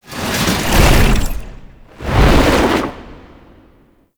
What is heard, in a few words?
Sentient Dactolyst Revive Recover; Warframe; Tau; Hard Mode